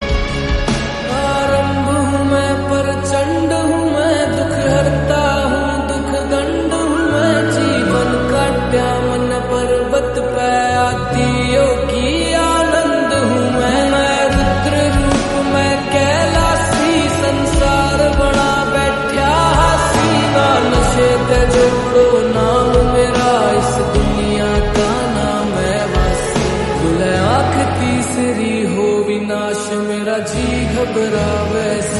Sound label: Haryanvi Lofi Song